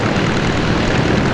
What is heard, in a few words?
motor; aerodino